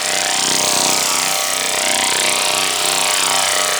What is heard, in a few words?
Door Laser Loop; Corpus; Warframe